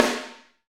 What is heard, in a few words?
SD Real, Roland; Sound & Vision - Gigapack I